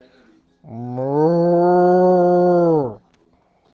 moooo

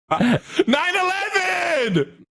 screams; nogla; 9 11

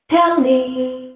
tellme jingle

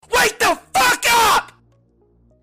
alarm clock

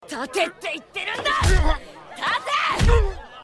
angry kicking; kurapikas

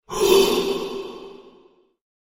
ghost damaged; phasmophobia

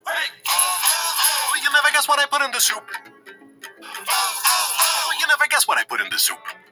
hey oh oh oh

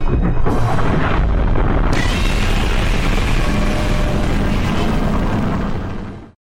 roar; godzilla earth

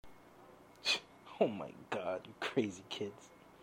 crazy kids

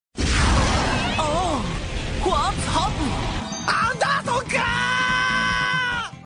ringtone; kore wa zombie desu ka

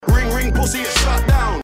ring ring

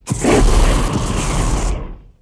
short fire blast

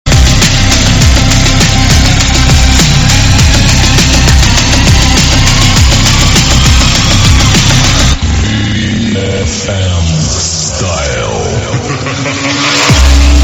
arabic trap

